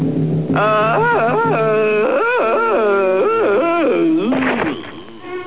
aaahaaah, cartoon

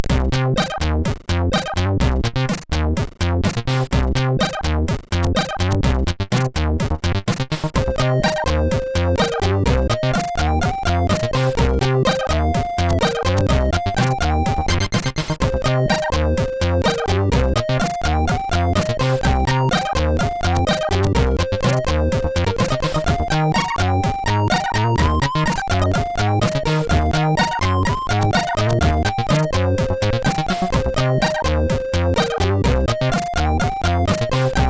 Rain Funk; SIDTunes

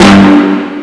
user hit; Outbreak

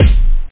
big bassdrum hihat closed

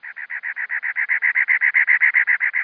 WREN; outlaws

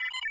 Beep (Incoming Message); Warzone

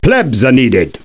Plebs; Caesar3

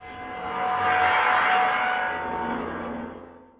harbor ronk; SpecOps2 MPlayer Demo

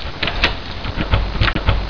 Lock & Key; Mosaic Magic

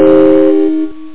EPiano Min4; AlgoMusic; Chords

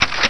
restore up; ARMYMEN

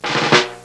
drum roll, percussion instruments; Sound Effects for Multimedia - Volume 1

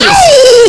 Shot Gecko; Apeiron

